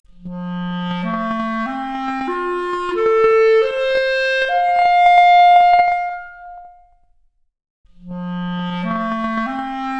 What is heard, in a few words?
Clarinet arp, Stereo output noised; 3bit